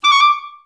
D5, Advanced Orchestra; Best Service

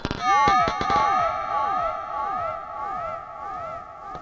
SPACEY L, Funk Guitar; Zero G - Funk Guitar

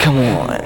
VOX SHORTS; Megabass Remix! 2

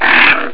pig; Amiga Soundtracker sample

